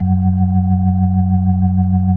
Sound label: B3, First Call Vintage Keyboards